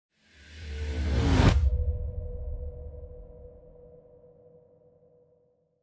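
Music Orchestral, Orchestral Transition Pre race start; Music Orch 2#7 (Orch Trans Preracestart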